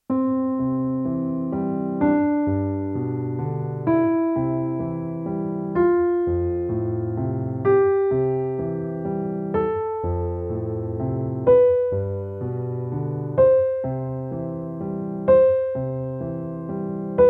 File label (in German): gebrochener Dreiklang Pedal